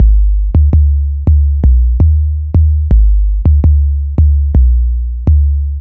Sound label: bass loop 165bpm Dm, Drum&Bass; Total Drum&Bass